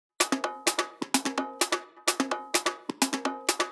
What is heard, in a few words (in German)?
Drumloops Beat 128 BPM ohne Clap